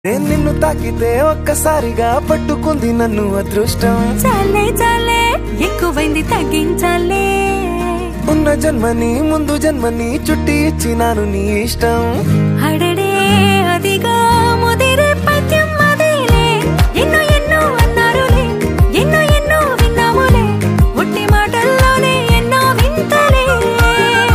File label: Love Ringtone